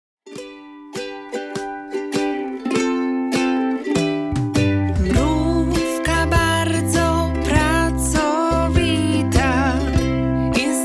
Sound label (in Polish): wersja wokalna